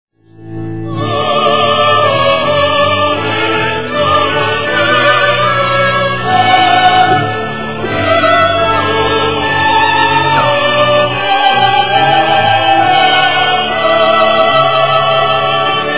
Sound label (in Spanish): amen cuarteto; coros solistas; haendel